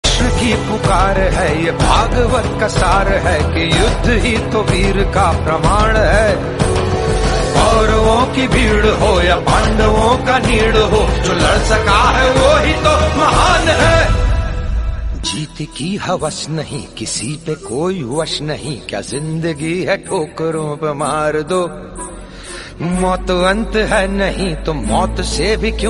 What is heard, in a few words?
Bollywood Movie Song Ringtone